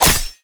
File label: sword close